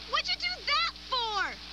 Exit Win; ranma - grimlock

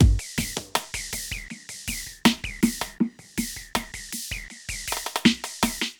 drum loop, ambient, 160; eJay

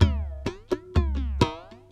PERCLOOP ETHNO 125; eJay

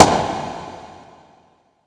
shot; VirtuaTennis

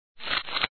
sheep sound

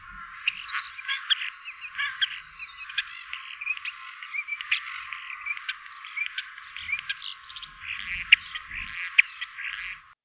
starling; The Ultimate Birder

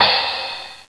cymbal splash; Sound Effects for Multimedia - Volume 1

